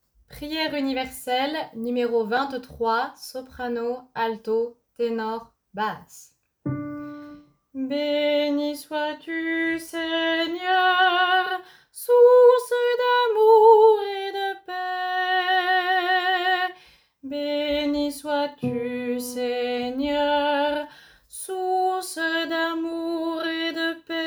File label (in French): Prière universelle SATB